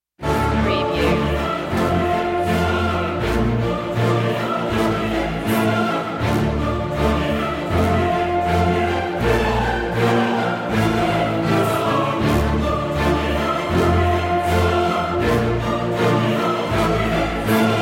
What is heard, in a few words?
no perc